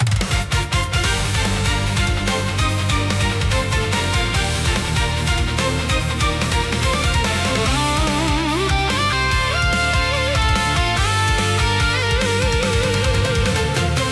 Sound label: Instrumental